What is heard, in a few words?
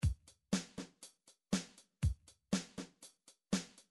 midi drums twist